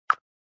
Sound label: button Click